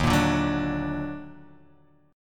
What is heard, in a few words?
D sharp Minor Major 7th D sharp down; strummed